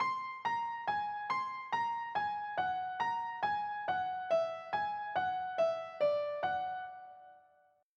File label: whole tone